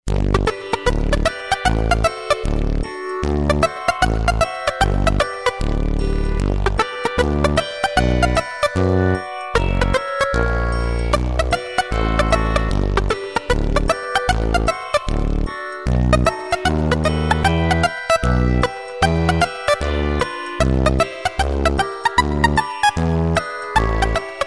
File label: Techno Rag